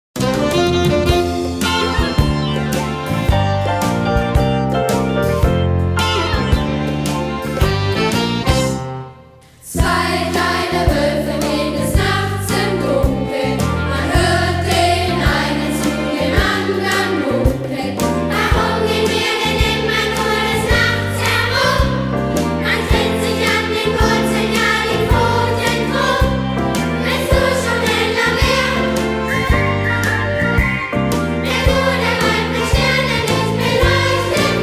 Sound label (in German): Zwei kleine Woelfe Chor; Alle Lieder Klasse 1 bis 7